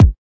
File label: kick